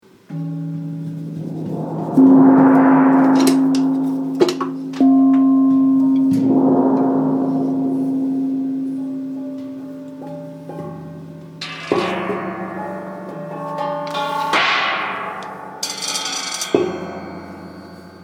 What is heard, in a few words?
gongs collage